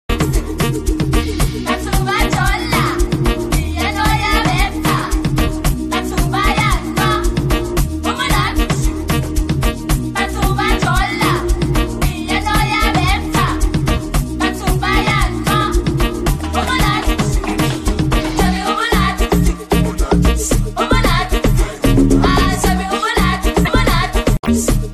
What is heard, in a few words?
Piano